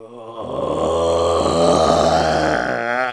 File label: death